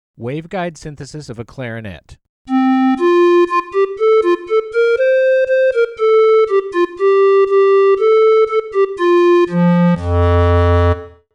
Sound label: Waveguide Clarinet Model